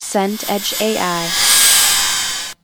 scifi drone flyby

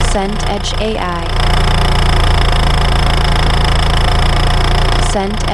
car engine idle diesel

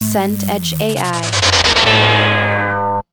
powerdown synth

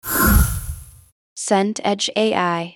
whoosh soft

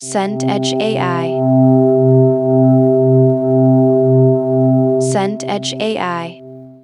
drone warm

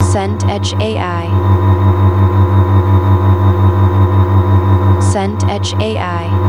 bed fridge hum

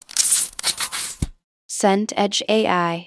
shelf books slide

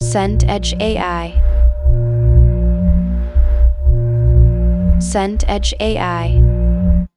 drone industrial

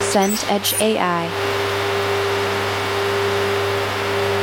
laminator feed

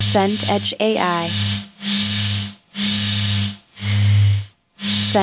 phone mobile vibrate desk

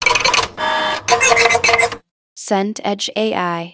car engine start cold

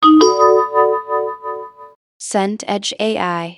vibraphone note

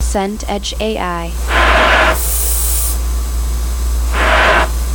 plane cabin cruise

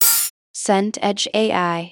hat open

